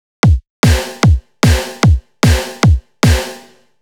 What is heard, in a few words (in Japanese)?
2キック＋スネア; vocaloid four on the floor beginner